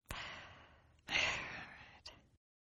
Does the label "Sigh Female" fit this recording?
Yes